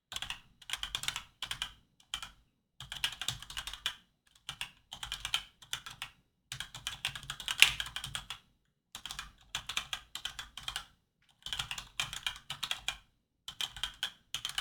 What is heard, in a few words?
razer pro type ultra typing sound